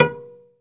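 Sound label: SOLO CELLO, Synclavier Strings; ILIO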